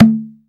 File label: TABLA